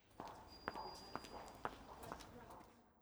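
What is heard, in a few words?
ambience generic sounds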